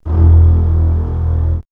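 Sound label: string, orchestral strings; X-Static Goldmine; backtothe70's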